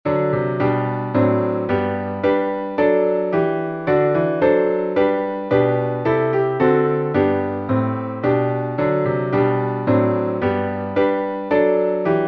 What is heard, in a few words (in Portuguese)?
salmo instrumental